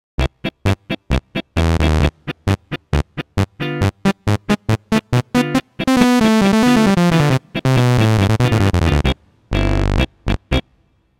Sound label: Tiroler Framus AZ 10